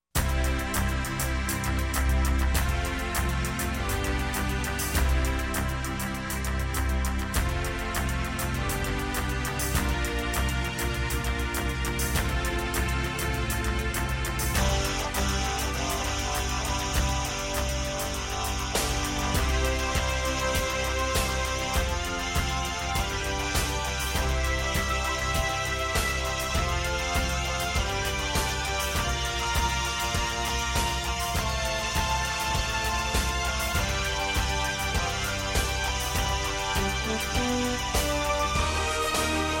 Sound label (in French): musique generique